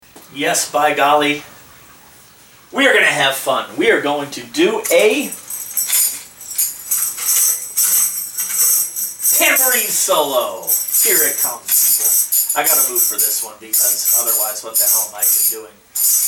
Tambourine

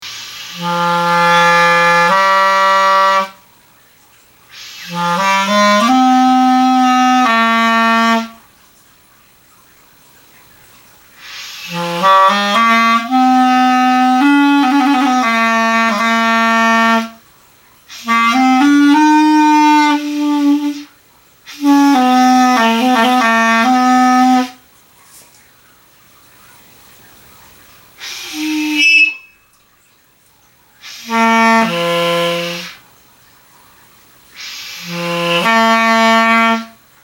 Clarinet

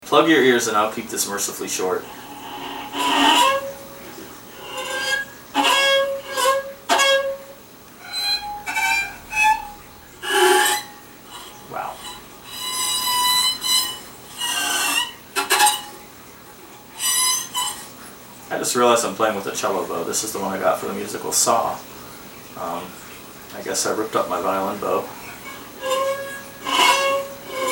Violin

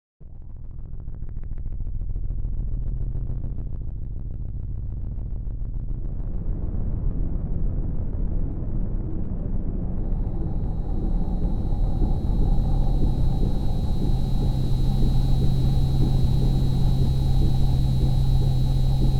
Intro Soundscape

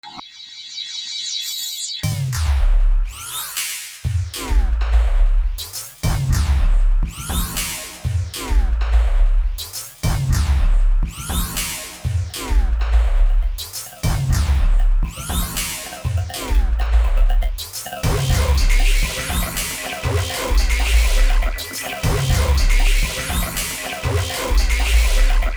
Cyborg Shuffle

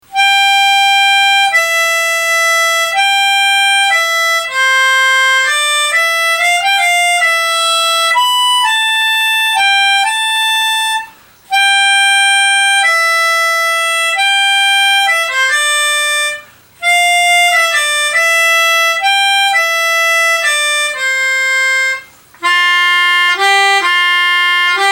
Melodica

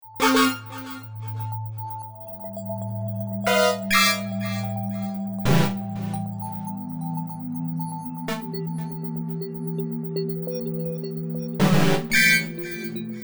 Ambience Act III

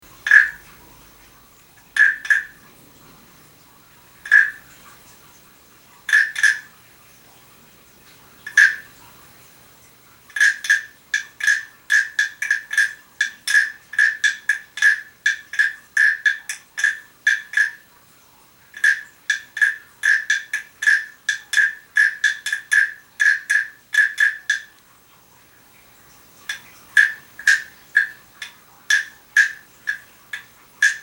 Guiro

